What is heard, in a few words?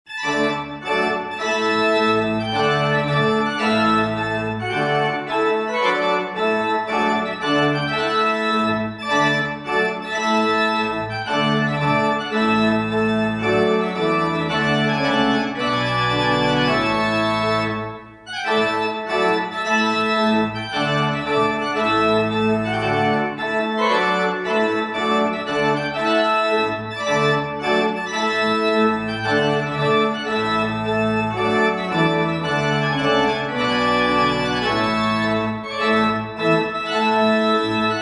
trumpet tune; purcell; vestre toten